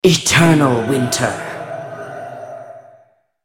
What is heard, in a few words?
attack; BlackIceAnivia